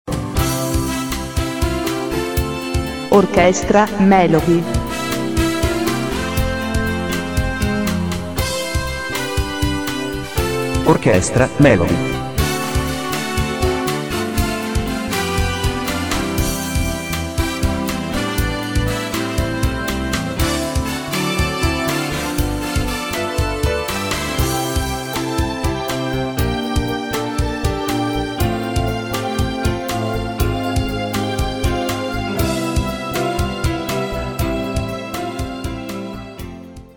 orch melody

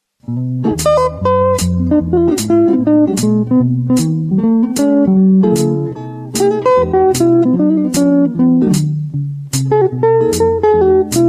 swing

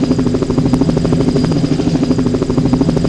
rock

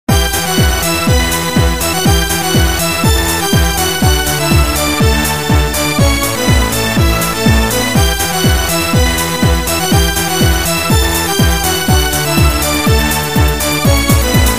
tuning

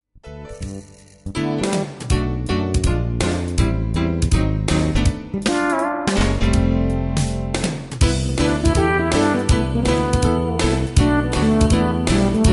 jazz

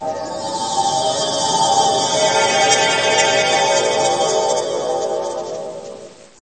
Magic music

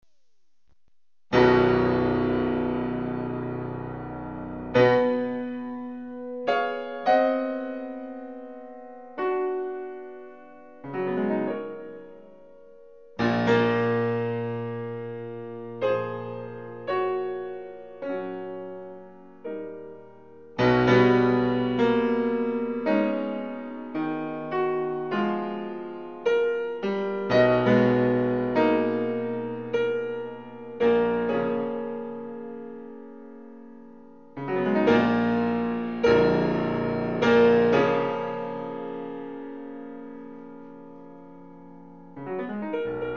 Piano Sonata; Elliot Carter

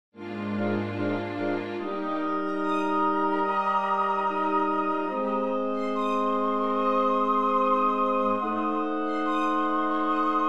Piano Concerto; Beethoven

